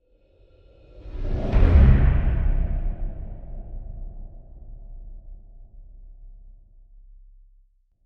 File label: Trailer Whoosh Deep Impact, Whoosh Deep Impact Horror Airy Breathy; Whoosh Deep Musical Impact Horror Airy Breathy Evil Scary Ominous; Trailers; Sound Design